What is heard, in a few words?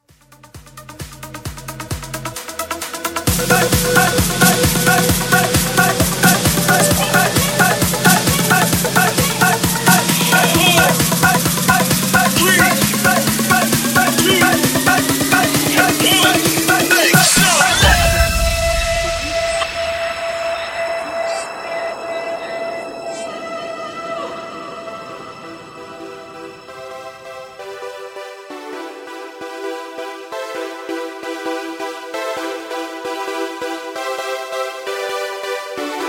Hype 132